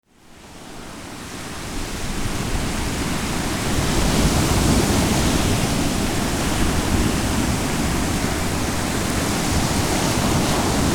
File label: pink noise